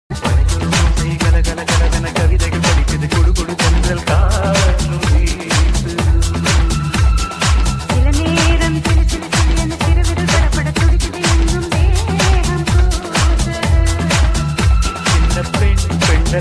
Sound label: tamil tune ringtone